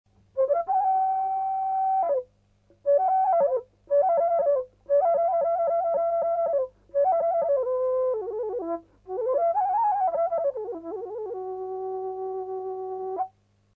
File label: flute